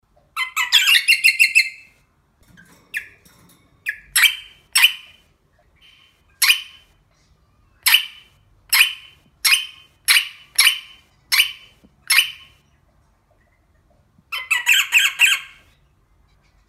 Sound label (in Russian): popugay